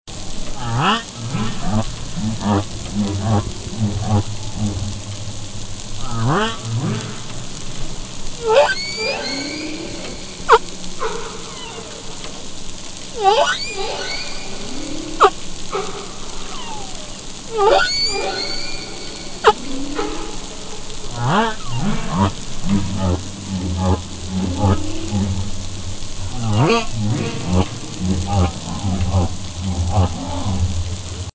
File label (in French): Megaptere chant, baleine a bosse; cetaces